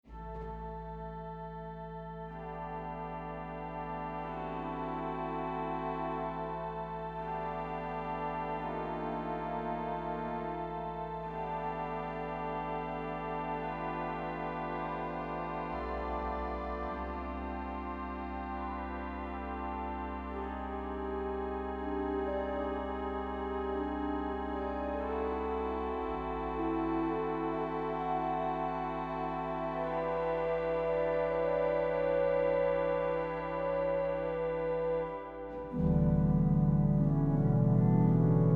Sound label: Crescendo